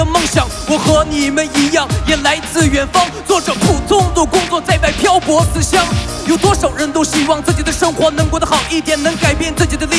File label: rap cn